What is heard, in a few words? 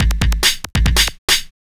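DRUMLOOP ELECTRO 140; Techno2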